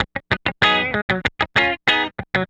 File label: Guitar, 70s Analog Funk